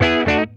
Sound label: E-B PASS, Funk Guitar; Zero G - Funk Guitar